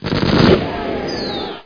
city

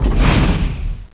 cat lnch; Siege+THF

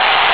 crowd; SOMC Family Forum

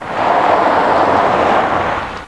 gravel

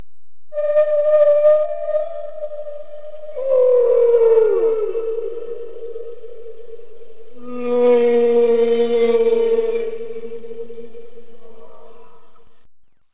whale

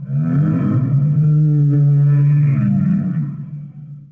sealife; DAoC; Toa; Gamestar

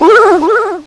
pain; QUAKE2

